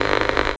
Interference

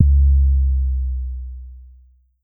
Kick Punch

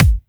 Kick

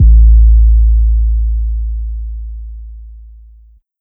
Kick 808

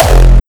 Kick Dist Hardstyle; Harddancewarrior

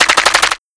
Sound FX Hi Tech

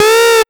Trap Synth

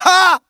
Vocal "Haa", exclamation

